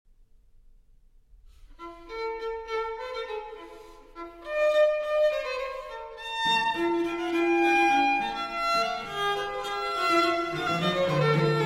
Trio Sonatas, Sonata in B Minor, Allegro ma non tropo; Ouvertures and Trio Sonatas, Op. 13, Sonata No. 2